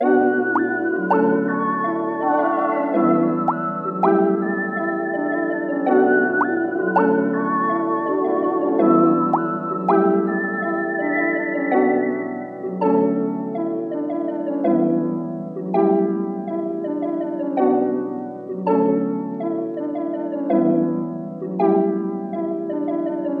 164 BPM B Min, Vintage & RnB Melodies; Rose; Cymatics

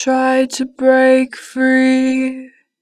Break free, Harmor Vocals; 2 bar flat; Pitch Flat

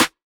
Snare - E, Hip Hop; Drums; One Shots; Humble Adequate Snare; Cymatics - Humble Hip Hop Sample Pack

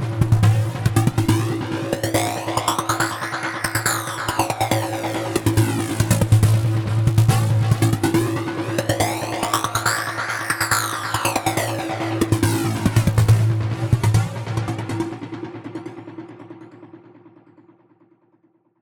Lead Line A 140 BPM, psytrance; Lead Lines; Freelusion

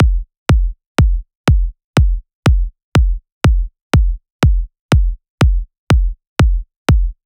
Deep House 122bpm Kick; EDM Drums; Drum Kits; Stems; Ghosthack; Ultimate EDM Drums; Deep House Kit 10

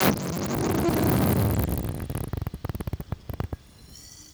fx pflitch

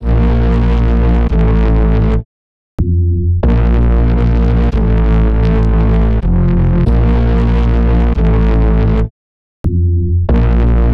140 BPM D Min Bass, Speed House; Melodies; Loop Stems; Cymatics; GHOST (Beta Pack); Cold Blooded

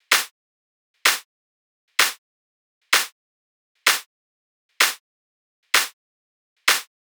Drum Loop Clap 128, Melodic Techno; Unleash; Ghosthack; AC2024 - Day 12 - Melodic Techno

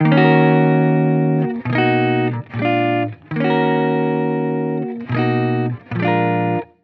Guitar Chord Loop 140 BPM D# Min; Future Bass; Instruments; Guitar Loops

